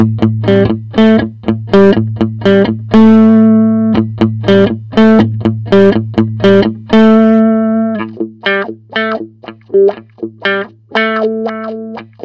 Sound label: wah-wah